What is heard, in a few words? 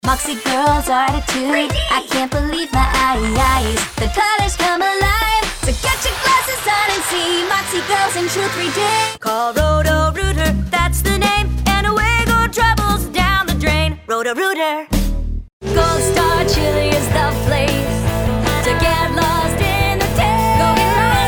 Jingle